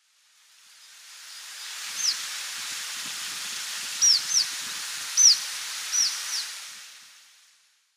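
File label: red-throated pipit, kin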